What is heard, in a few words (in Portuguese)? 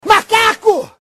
Macaco Sound